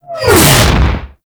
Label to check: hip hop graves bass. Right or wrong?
Wrong